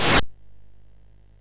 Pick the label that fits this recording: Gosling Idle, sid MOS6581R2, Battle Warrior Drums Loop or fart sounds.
sid MOS6581R2